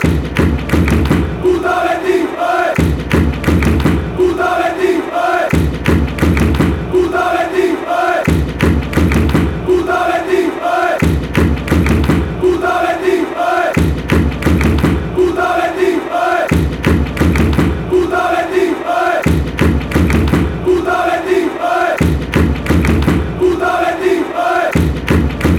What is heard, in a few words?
betis fanchants